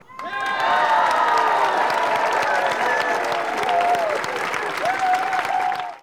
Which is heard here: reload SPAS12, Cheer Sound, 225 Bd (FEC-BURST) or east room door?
Cheer Sound